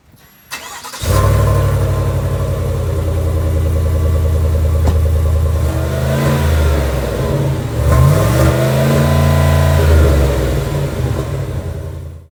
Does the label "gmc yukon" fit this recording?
Yes